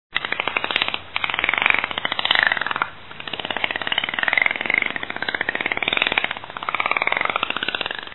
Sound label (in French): clics dauphin